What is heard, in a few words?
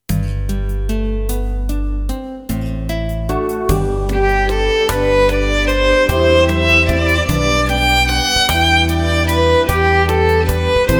Violin